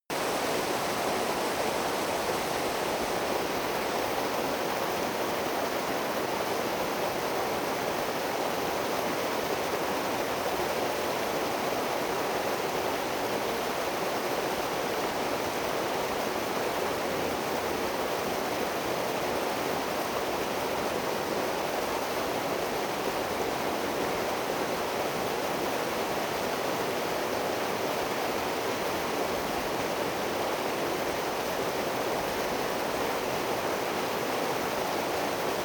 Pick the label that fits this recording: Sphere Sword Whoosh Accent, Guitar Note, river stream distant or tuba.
river stream distant